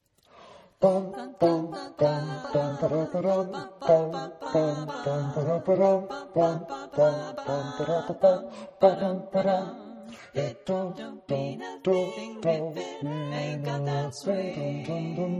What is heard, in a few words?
Swing with slow ending bass